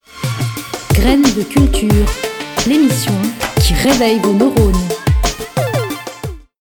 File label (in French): musique jingle; graines de culture